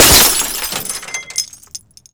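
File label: glass break automobile small; Explosions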